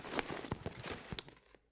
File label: Cloth; mwiim4